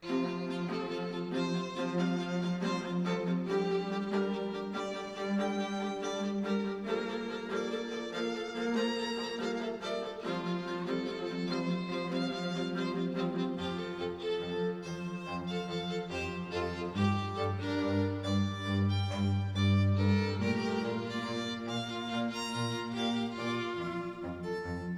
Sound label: String Quartet Ensemble